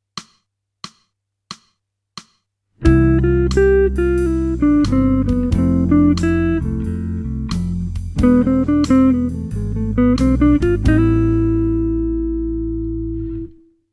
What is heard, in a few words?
jazz